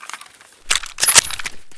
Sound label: deagle misfire, weapons